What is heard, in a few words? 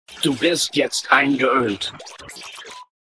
jumpscare; p diddy